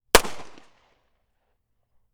gun 9 mm beretta

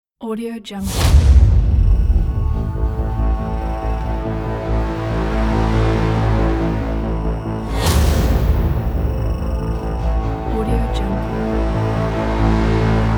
epic trailer; idrono

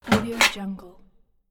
stamp

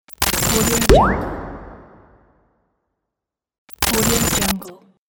short digital glitch ident; 3dhome

